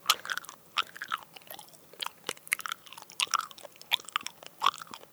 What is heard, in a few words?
eating